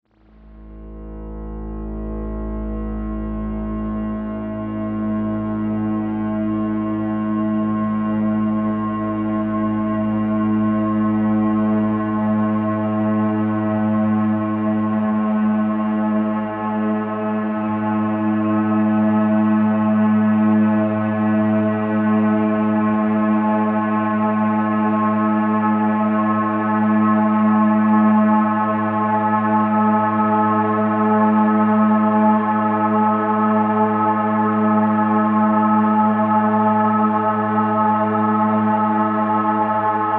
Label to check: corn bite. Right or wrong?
Wrong